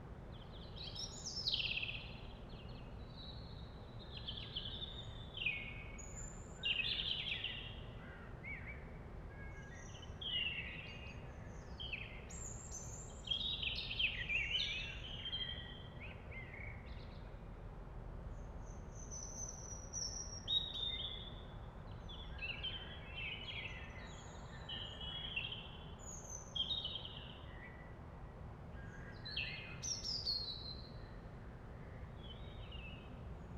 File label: Tree Bird, ambience